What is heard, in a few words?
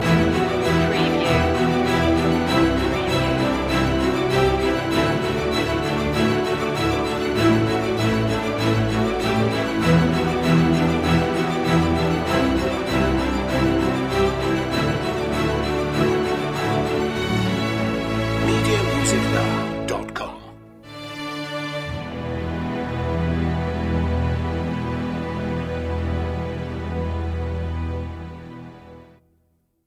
strings only